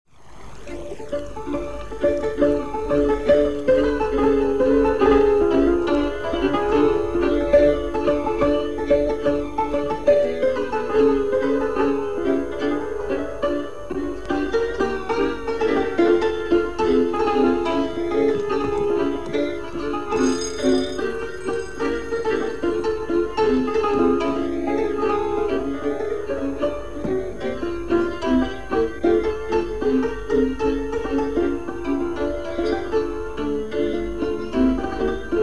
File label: cymbaly; ukr kino; bilyj ptah